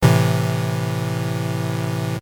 bad roll; Dice World

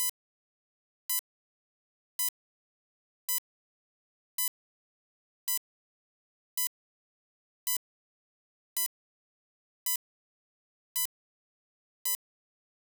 Hospital Life Support; Medical; Blastwave

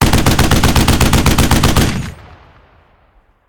Gun, Machine, Browning, Short Burst; gun and weapon sounds; captain audio; fully loaded

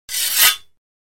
Sword Scrape; Hollywood Edge; Martial Arts and Human Impacts

